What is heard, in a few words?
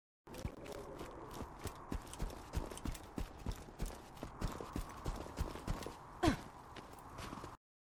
wind; borderlands 2